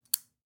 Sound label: Snip, Foley Percussions; Dubstep; Ghosthack; Dubstep Sound Arsenal